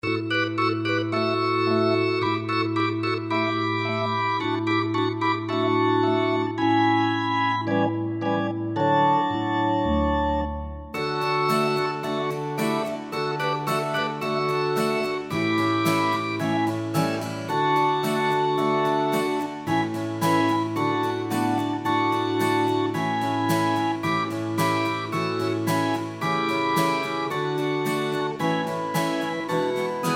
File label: Karaoke